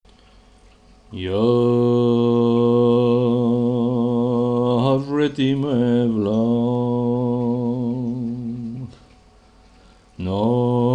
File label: naat; Musica Turca y Arabe